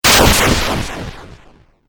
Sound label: Fireball Video Game; Video Game Sound Effects